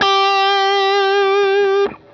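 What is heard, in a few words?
fuzz guitar vibrato; MAYFUZZ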